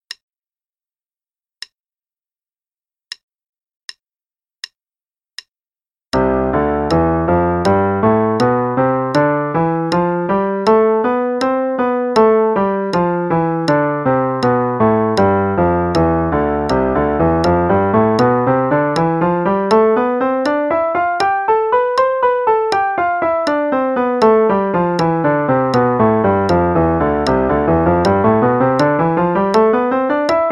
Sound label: Play along C Major Scale (8ths, triplets, 16ths, qn=80); Scales; Piano